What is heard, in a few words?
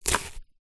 knife slice onion